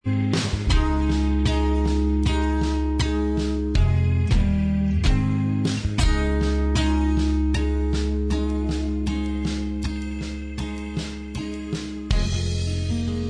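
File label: Instrumental Track, backing tracks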